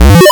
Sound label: 8 Bit Pickup Sound